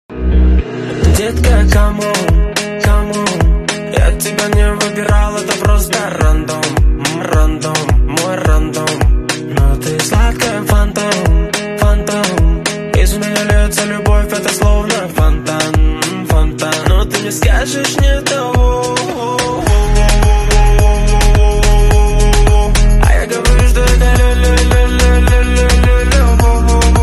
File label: Groove